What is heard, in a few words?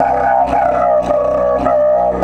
108, Didgeridoo; Big Fish Audio - Didgeridoo and Other Primitive Instruments